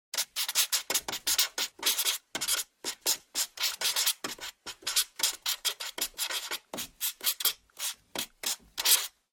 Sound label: Felt Tip Pen Write